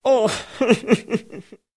zvuki muzhskogo placha

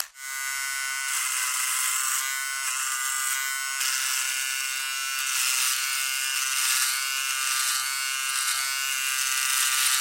zvuki mashinki dlia strizhki volos

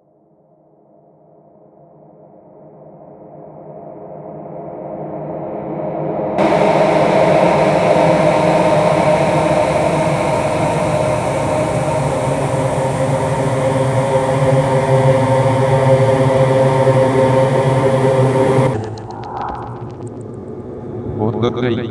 ochen strashnye zvuki i kriki

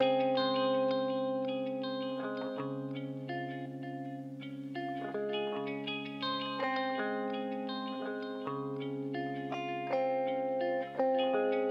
zatsiklennye melodii dlia gitary loop

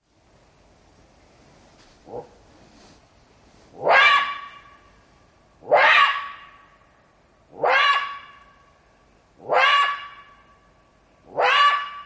zvuki shakala

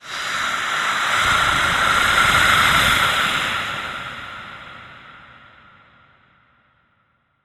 zvuki strashnykh golosov

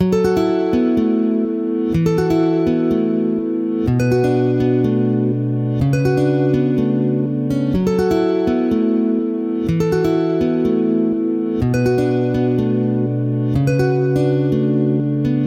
zatsiklennye melodii dlia gitary loop

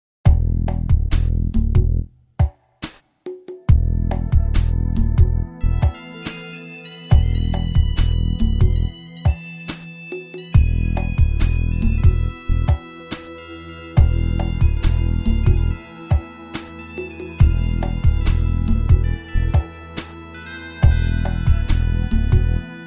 arabskaia fonovaia muzyka bez slov

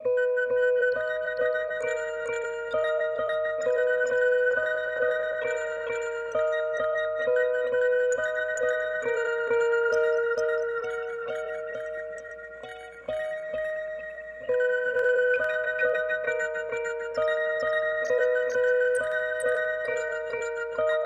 zatsiklennye melodii dlia gitary loop

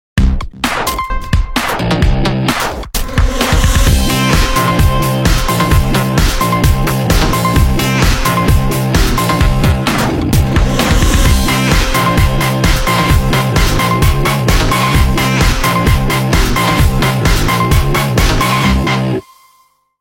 originalnye ringtony Nokia 5310 XpressMusic

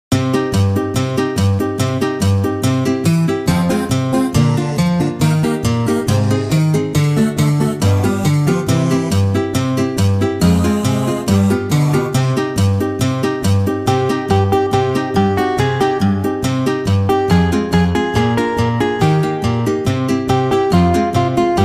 muzyka bez slov iz sovetskikh filmov

